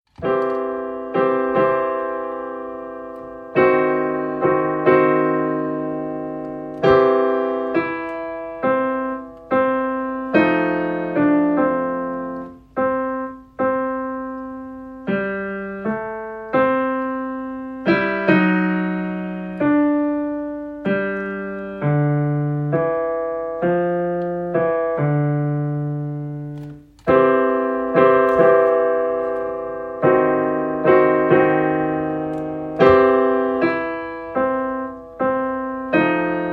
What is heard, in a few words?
Deep River Blues, Home Recorded, student recordings